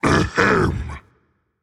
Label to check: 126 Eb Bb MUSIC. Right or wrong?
Wrong